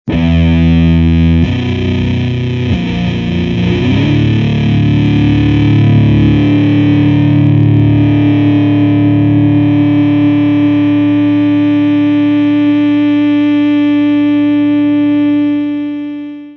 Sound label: fuzz; bridge humbucker,tone full CCW